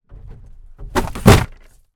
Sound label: trash bag full throw into plastic bin, household